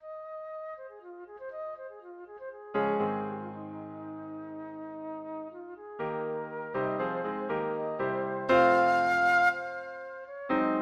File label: flaxen hair, flute